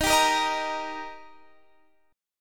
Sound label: Eb Major, down; strummed